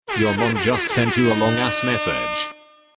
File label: sms signal